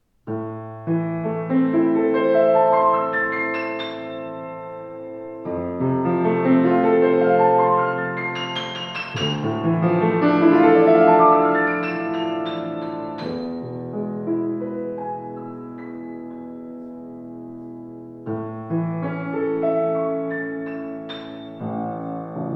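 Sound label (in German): Yamaha C3 Kreuz, flügel; 186cm-c3-fluegel-2003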